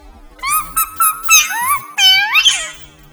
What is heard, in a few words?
high pitched